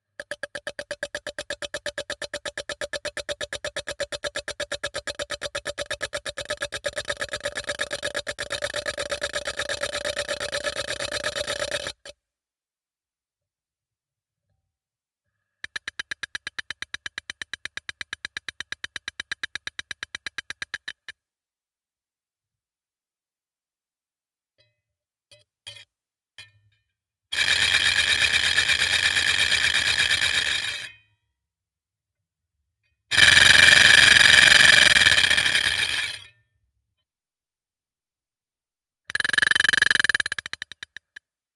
contact cast iron lid scraped with blender resonate and muted mono; sonniss gdc